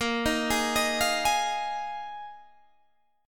A sharp 7th Suspended 4th down Guitar DADGAD

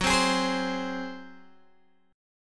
Gb Suspended 2nd Flat 5th, Guitar, Open G; x,11,10,13,13,10; down; chord shape

